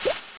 ashring move

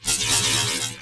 sound blend shot

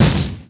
turtle move; turtle general

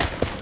move; assulter

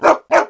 strike; wug

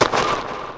bloody knight move

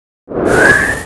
WIND; shinkiro shiranui; SS FOUR CHARM

